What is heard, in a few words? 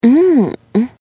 mmm